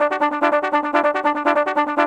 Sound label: Trombone Effect, effected trombone